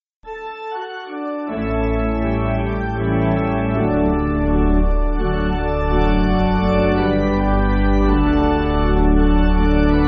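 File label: Pipe Organ, Music Backing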